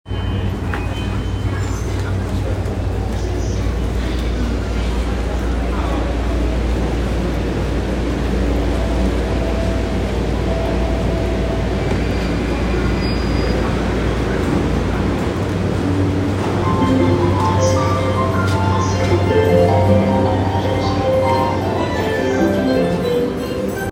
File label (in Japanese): JR 横須賀線 戸塚 北, train sounds